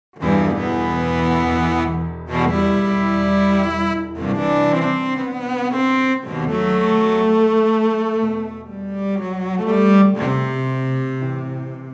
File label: Cello; Elgar; swam